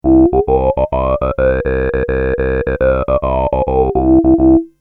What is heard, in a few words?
modular vocal filter